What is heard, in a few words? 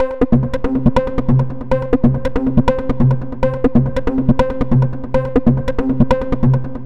140 House Arp Cmin; Dance; DJ; Synth; Arps; Pro Tools Essential Loops; Digidesign